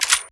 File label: ammo short; Tailgunner